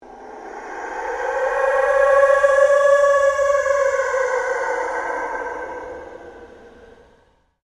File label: PULSE LONG SWEEP